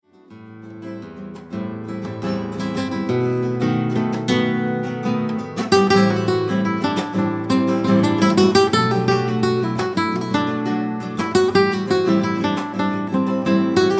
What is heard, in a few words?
Guitar